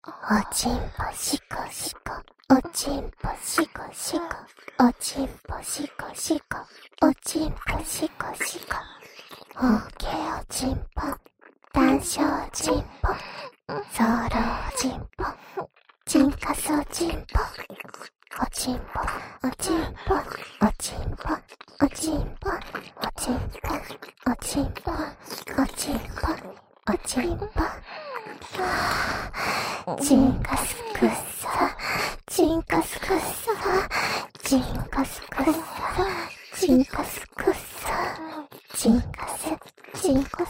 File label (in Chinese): 紧贴轻语侍奉♪＋深吻; 自慰辅助特典音声